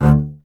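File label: STR CBS, Solo Instruments, double bass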